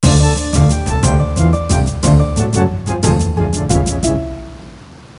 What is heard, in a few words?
conflict start